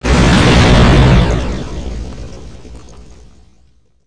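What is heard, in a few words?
counter measures explode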